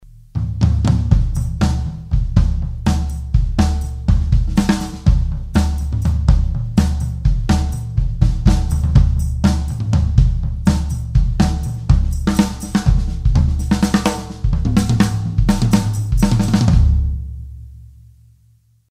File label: big band groove